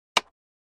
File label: Cards Deck Slam; casino games card games